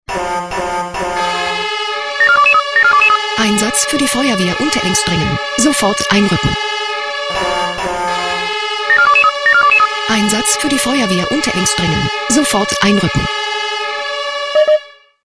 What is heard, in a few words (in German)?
SMS Alarmierungstone; Unterengstr